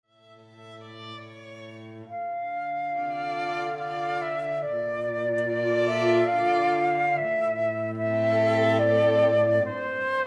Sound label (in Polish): karaoke; spij dziecino